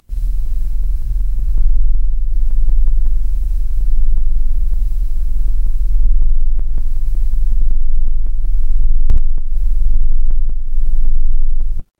ambience room tone

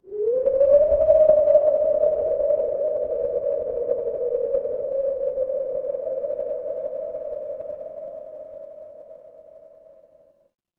ambience wind

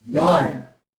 feedback level up

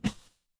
cartoon poof

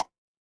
ui pop

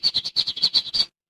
animal bird

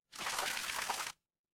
water stream

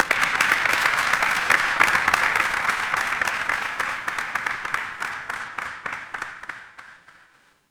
crowd applause

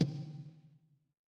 ui click

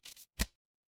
paper fabric card flip

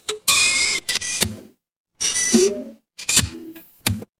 mechanical lever

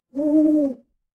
animal owl

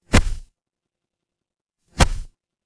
paper fabric cloth whip

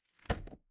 impact slam

